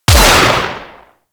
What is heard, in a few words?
Heavy Shotgun Alt Shot; Warframe; Tenno